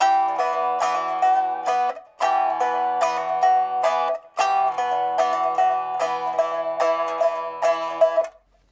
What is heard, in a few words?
Bm Rythm Pipa Lute, 110